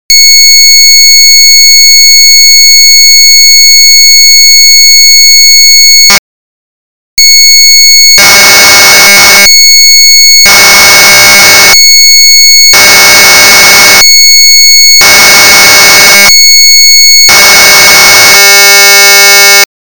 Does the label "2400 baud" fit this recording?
Yes